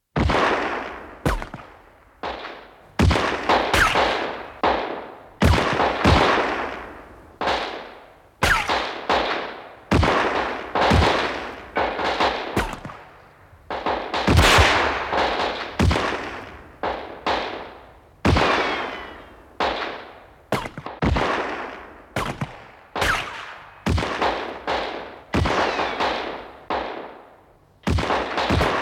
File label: Gunfight